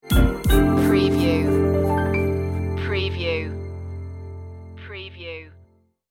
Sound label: gravel groove sting